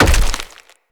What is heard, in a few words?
Blunt Heavy Impact Flesh; Weapon Impacts; Combat